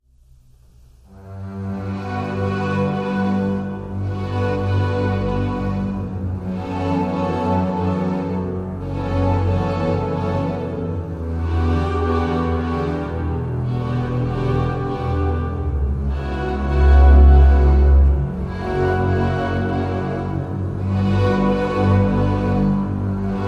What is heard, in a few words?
Only Strings